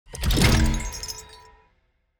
ambience room portal starting

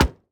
environment hammer hit

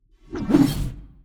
morgenstern swing; player

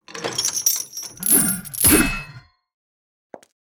demoness strike

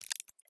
ui time control

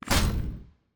Enemy Shields Get Hit; TANK; 3Souls

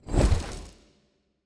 unit swap; player

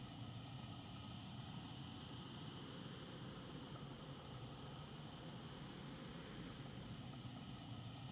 ambience room shadow room base layer

